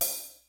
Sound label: HOUSE KIT, DRUMS; Kid Nepro; Elite